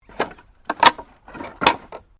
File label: Wood Movement Medium